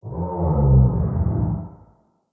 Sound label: Vocal Enter Stomach; Ambience; Bromius Inside